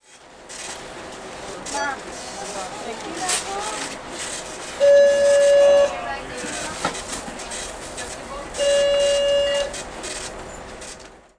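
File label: department store, ambience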